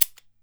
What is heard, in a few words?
cabasa; E-MU Producer Series Vol. 7 – Old World Instruments